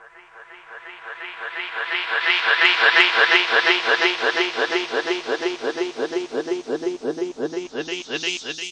deeper loop filter odyssey, male; MALE MISC and SPOKEN WORD

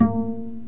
Plucked Bass A sharp 4, Upright Bass

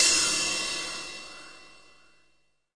Ride

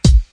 kick

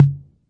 606 tom, drum machines; 606 Basic

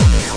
obnoxious kick; Kicks; single hits

